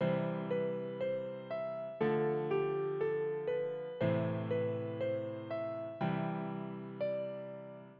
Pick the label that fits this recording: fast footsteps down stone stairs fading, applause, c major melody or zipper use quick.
c major melody